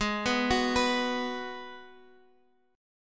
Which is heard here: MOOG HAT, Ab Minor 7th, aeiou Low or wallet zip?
Ab Minor 7th